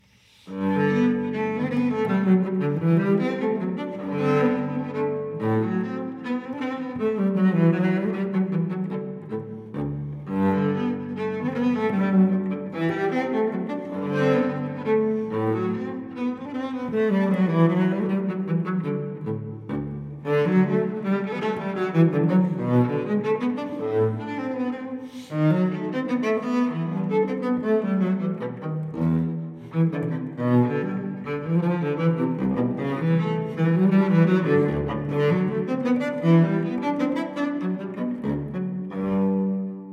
Cello Suite No. 1 in G major Menuets I II; BWV1007; Johann Sebastian Bach

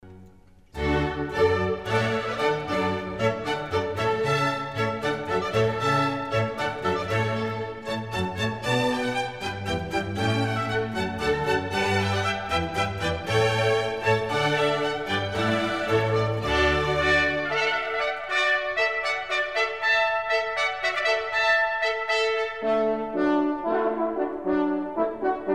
Water Music Suite

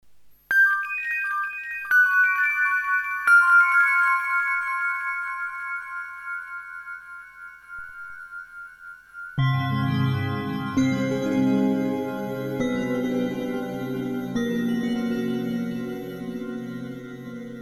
Bell Threshold; Pershore

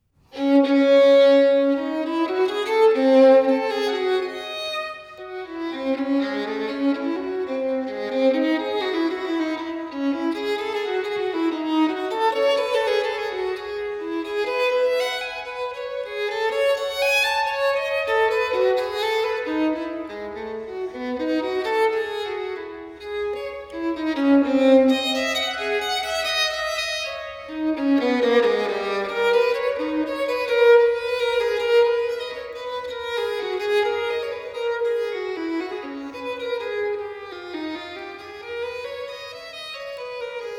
Partita in D Minor, Violin Solo; Partita nr.2; BWV 1004; Bach; Sonatas & Partitas for Violin Solo

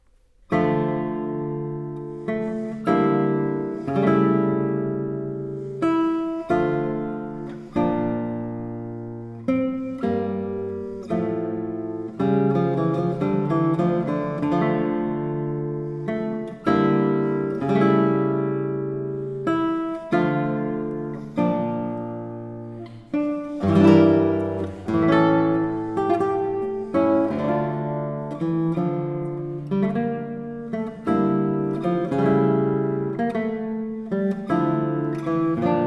Violin Partita No 2 in D Minor, guitar, Ciaccona; Bach Transcriptions and Arrangements for Guitar

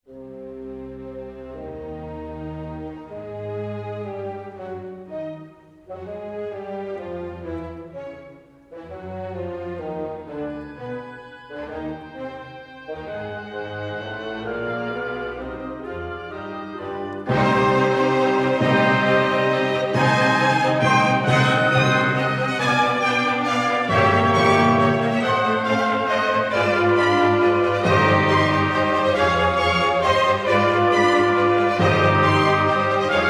Piano Concerto No 24 in C Minor; Wolfgang Amadeus Mozart; Piano Concertos Nos 20 & 24

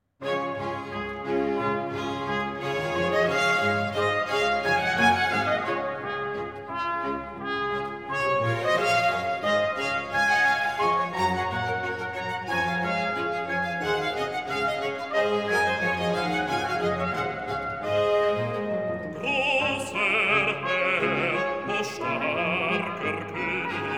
bass; Grosser Herr, und starker König; Bach; Christmas Oratorio BWV 248

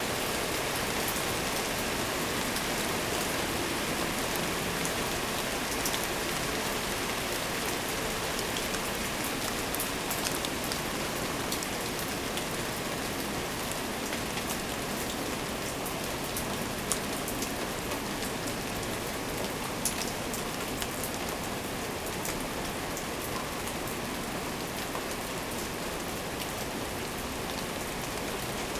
Portland Rain, Field Recordings; Oregon Rain; Omni Gardens

